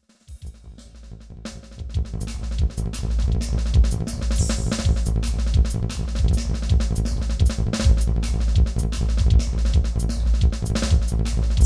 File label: Drum n More in 9